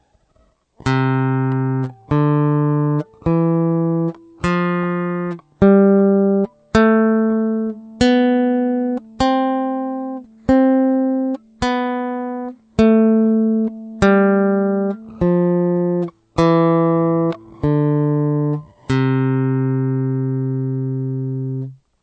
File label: scale, guitar